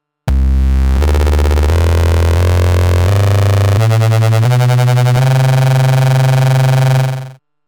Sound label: Waverazor Dual Oscillator Slice Keytrack 50 Prozent; MOK